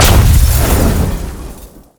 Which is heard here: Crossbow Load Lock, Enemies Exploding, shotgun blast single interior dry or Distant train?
Enemies Exploding